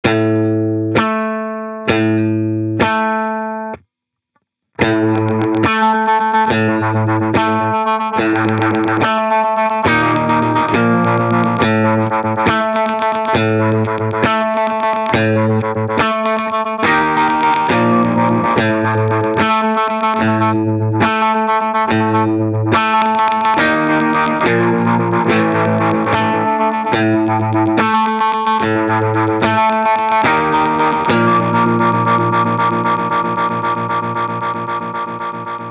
Stutter Auto Stutter

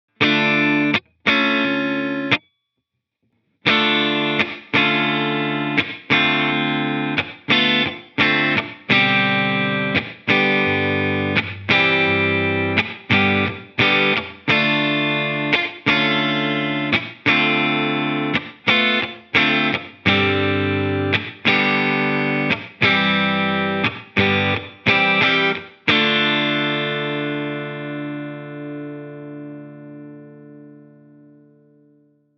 Delay Reverb Plate

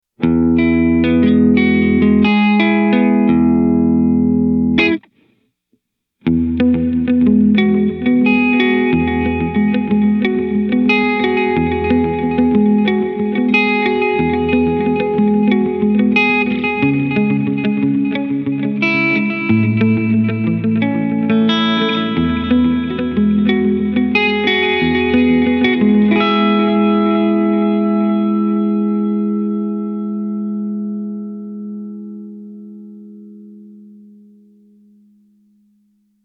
Delay Blendable

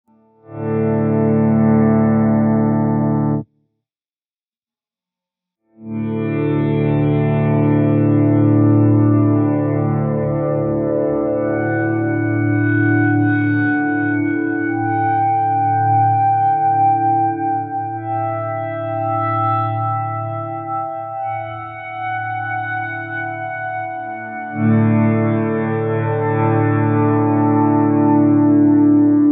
Delay Reverb Hall

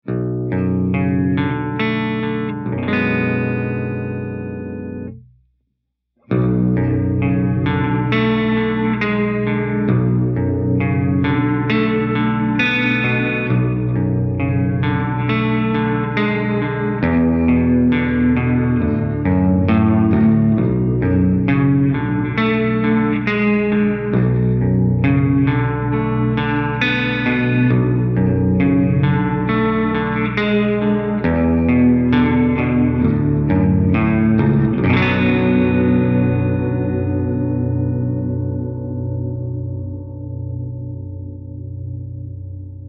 Modulation Chorused Reverb Baritone

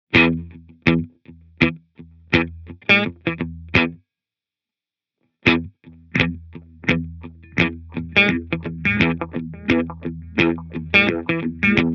Filter Pulse

